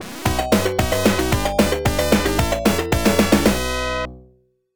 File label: Match End; Jennifer Capriati Tennis